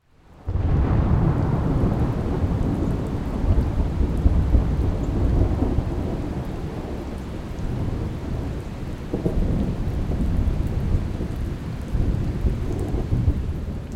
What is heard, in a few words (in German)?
Gewitter; Deutsch lernen Magazin